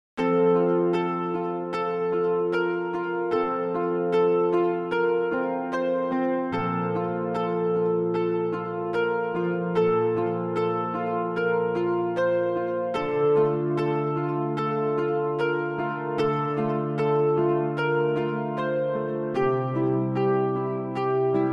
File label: Inspiring Acustic Guitar and Choir